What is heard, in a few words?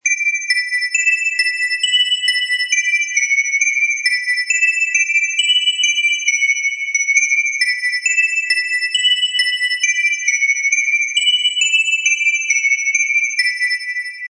Horr Bells Bm, Bells horror loop at 135 bpm.